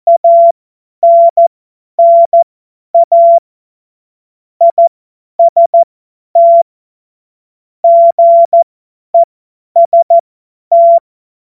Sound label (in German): Witz, Morsekurs, 25 F70; Witze